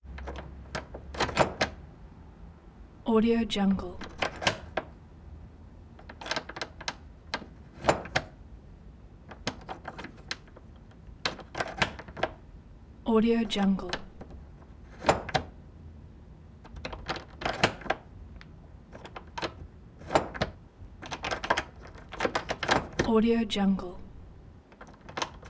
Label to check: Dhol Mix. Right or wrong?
Wrong